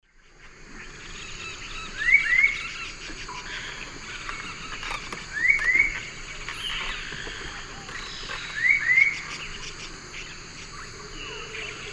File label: Attila citriniventris, call; S. America; Eastern Lowlands